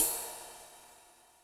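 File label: Ride Dark, Yamaha DTXpress Cymbal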